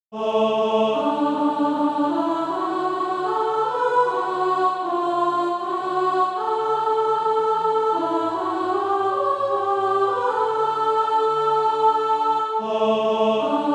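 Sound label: soprano, Practise Music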